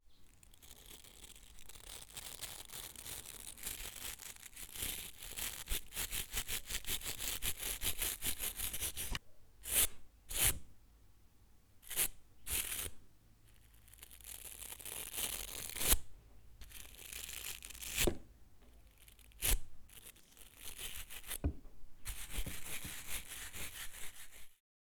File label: Hard Nail Scrubber Brush